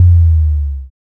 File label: impact boom